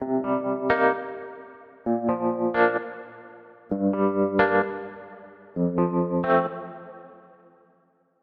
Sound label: Hybrid Standard RB; midi wizard